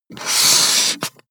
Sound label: marker mark hard long